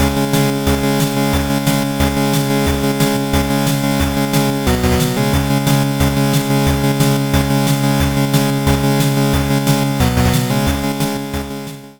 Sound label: guitar